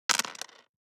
DICE on Plastic, Throw and Roll, Standard, 3 Three Dice; Samples; ArticulatedSounds; DICE Sfx Party (Extended)